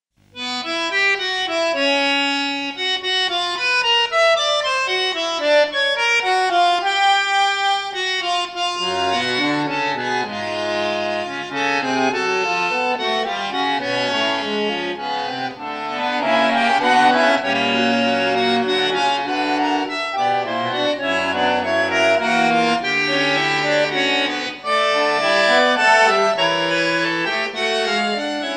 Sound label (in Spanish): Fuga Si bandoneon; BANDONEON SOLO